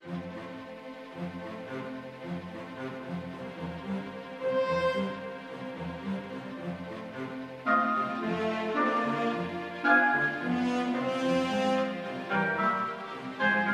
Piano Concerto No 21 2nd Movement, Classical music; Mozart